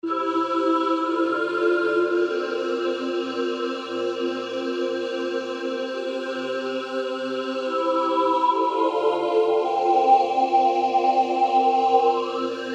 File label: PD Formant; SERUM